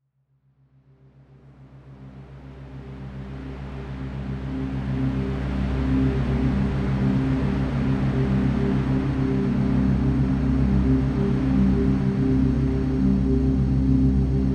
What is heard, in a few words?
Soul bells ambient armonization